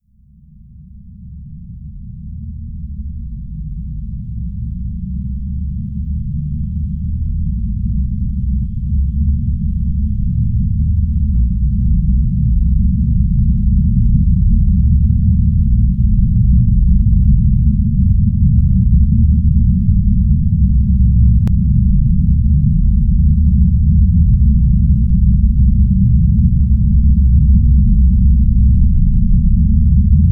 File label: Noise Zone; NOISE ZONE V